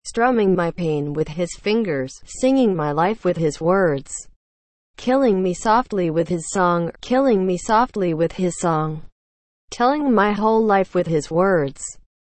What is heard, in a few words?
strumming